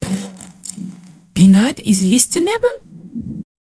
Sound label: jingle